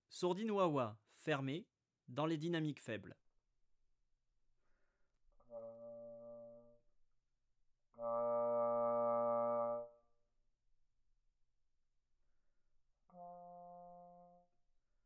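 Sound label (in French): sourdine wa-wa fermée dynamiques faibles, trombone; Sourdines; Sourdines fixees; traite orchestration; trombone tenor trombone basse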